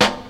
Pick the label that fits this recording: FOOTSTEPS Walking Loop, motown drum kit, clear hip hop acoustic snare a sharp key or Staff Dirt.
clear hip hop acoustic snare a sharp key